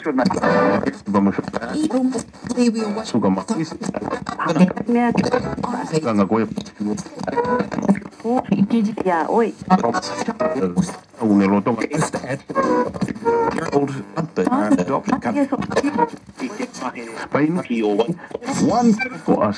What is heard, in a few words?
Radio Station Search; Loops; Texture; Ableton Live 12 Lite; Core Library